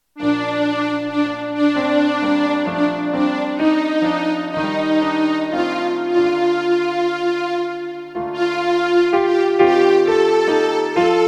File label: SOPRANO 2 instrumental